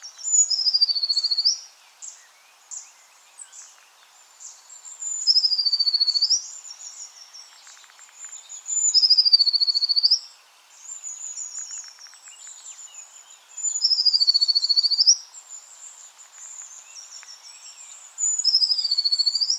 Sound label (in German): Blaumeise Cyanistes caeruleus, vogelstimmen